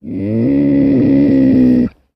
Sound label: Leopard Growl Hollow; big cats